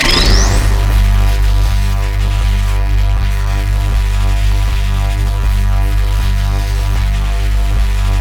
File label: object use loop; portal 2